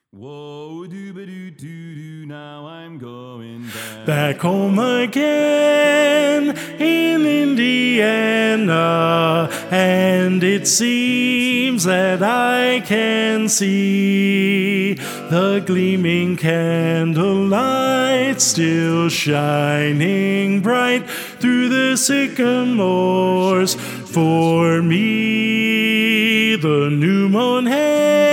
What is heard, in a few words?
Lead, quartet